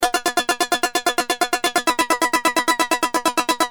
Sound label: Keys, Industrial